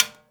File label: TIMBALE, Percussion; InVision Interactive; Percussion Slam; SULTAN